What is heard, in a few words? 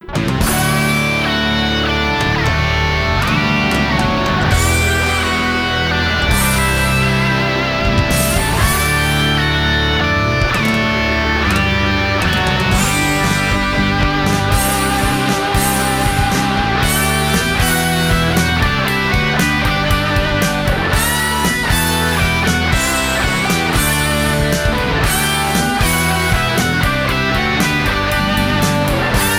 karaoke full mix